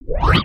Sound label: zoom in